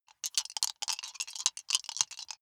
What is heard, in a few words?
big three rattle in hand closed